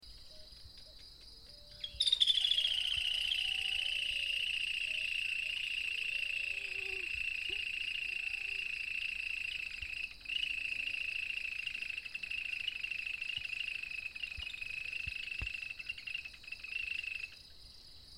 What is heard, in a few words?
Laterallus viridis Sanã castanha